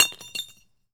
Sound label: BANG, explosions gunshots